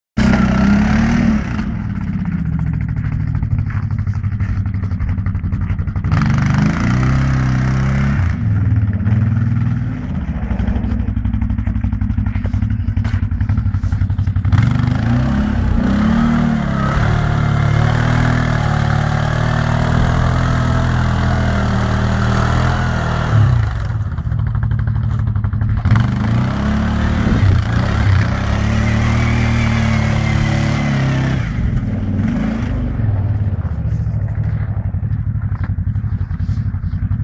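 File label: quad bike sound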